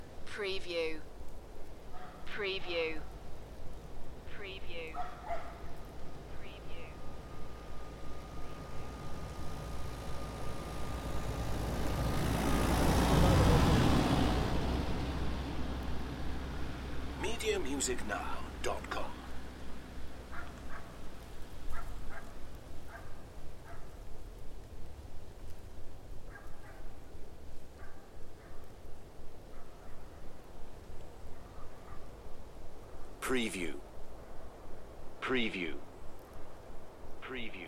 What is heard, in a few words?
Welsh Forest ambiance drive by people chatter and dog barking; beanstalk audio